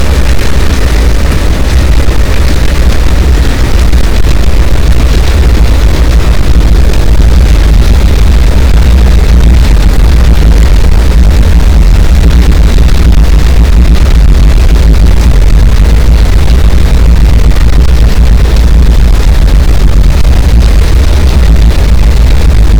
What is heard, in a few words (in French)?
cachalot, boat; Physeter Macrocephalus